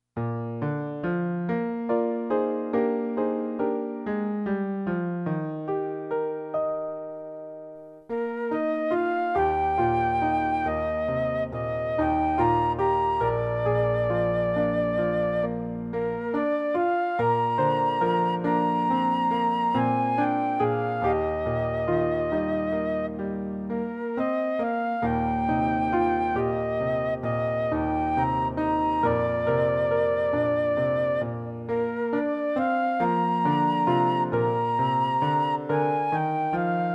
Eb, low, piano acc.; Down in the Valley

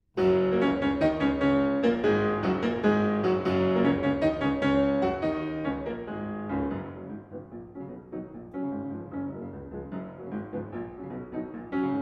Le banjo; Gottschalk

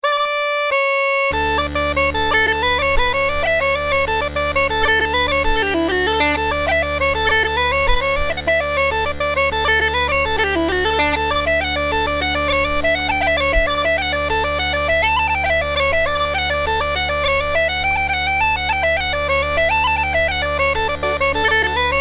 Uillean Bagpipes

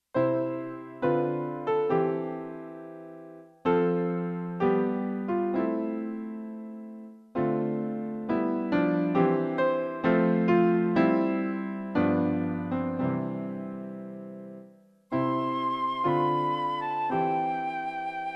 C, low, piano acc.; Caro mio ben

